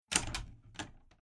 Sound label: Household Old House Large Door Latch Closed Metal Rattle Eerie; Tom Hutchings